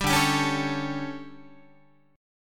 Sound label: D Major 7th Suspended 4th, Guitar